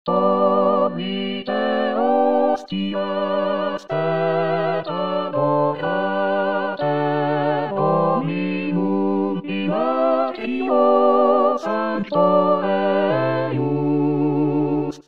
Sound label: Tutti; Tollite hostias